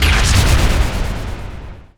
crit; weapons; dota; kez

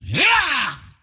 toy pug attack emote; dota; pudge

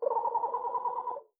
hornbill, jungle; ambient soundscapes; dota

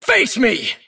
attack pain; davion; dota

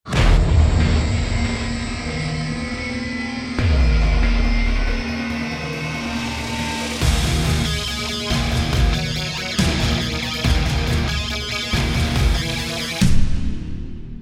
lose

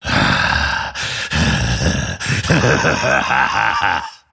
grimstroke laugh; dota

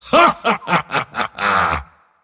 mars laugh; dota

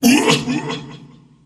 pain; skeleton king

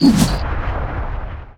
charge, movement; spirit breaker

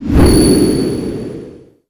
troll priest heal; weapons; dota; creep; neutral

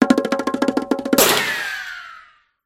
ricochet

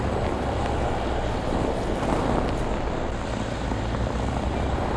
drill, cavern; ambient soundscapes; dota

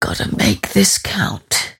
cast pain; dota; mirana

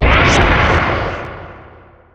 blinkstrike; weapons; immortal; dota; riki

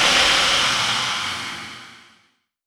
soul assumption target; visage

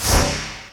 attack, weapons; dota; dark willow

